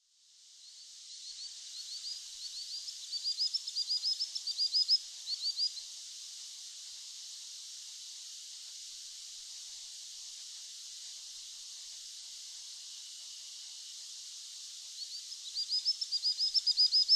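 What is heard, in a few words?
Common Sandpiper, oketo; isoshigi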